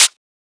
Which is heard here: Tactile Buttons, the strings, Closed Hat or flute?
Closed Hat